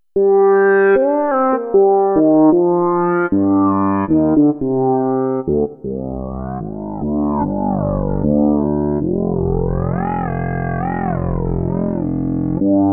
formantik expressive, synthesizers

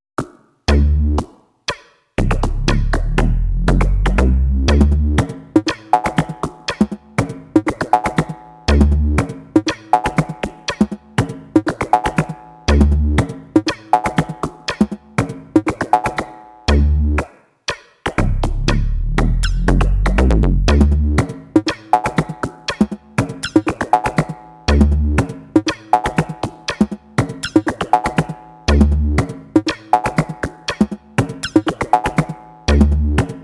some little beats

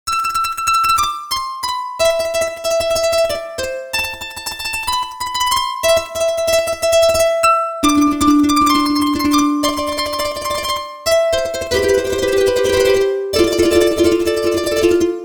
harptime free run, synthesizers

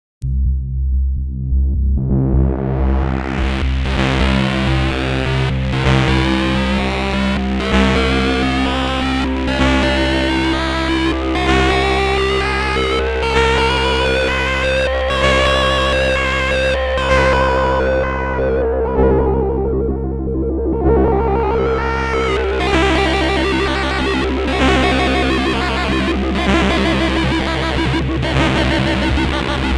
wave sequencing Square LFO

